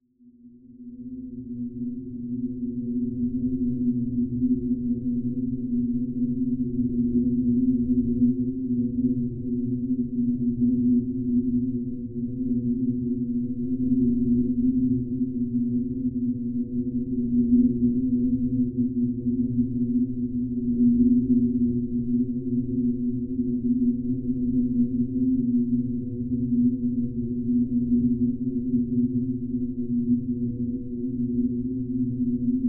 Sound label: Sci Fi Electronic Hum Distant